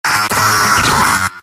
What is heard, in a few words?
pain sharp; demoman; video games; source engine; tf; mvm